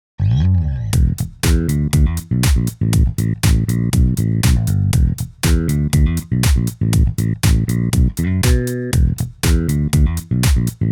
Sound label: bass comparing vst fing